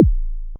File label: Kick, Roland MC09; Drums